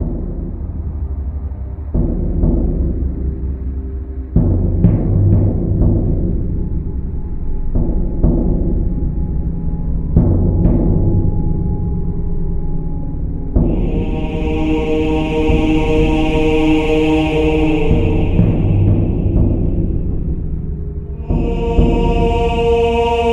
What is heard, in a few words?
Cave; conan playstation 2 gamerip 2004